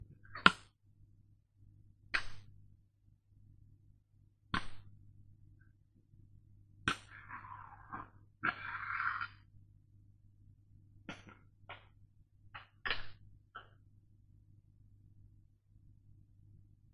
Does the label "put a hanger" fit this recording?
Yes